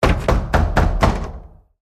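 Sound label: Event Dream Door Knock; the unfinished swan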